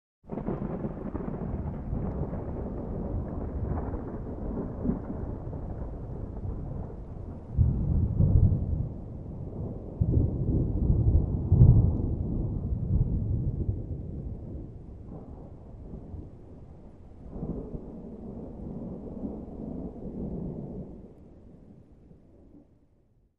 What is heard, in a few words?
Thunder; Medium Distant - Crackle & Rumble with Light Rain